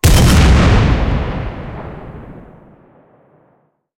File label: cannon 57mm qf6 shot, cannons tanks